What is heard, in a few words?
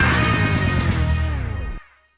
Failed; Sega Golf Club Version 2006 Next Tours; arcade